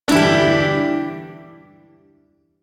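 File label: Shawzin Major Chord; E; Warframe; Duviri Shawzin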